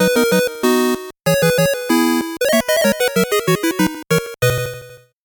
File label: 8bit jingle normal